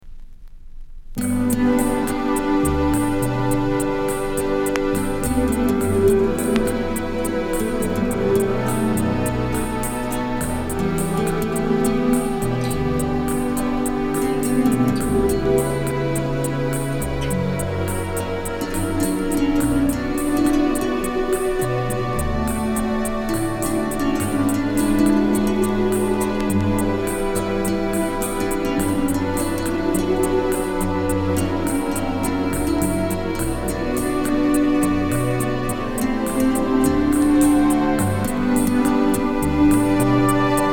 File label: guitar ballad with latin rhythm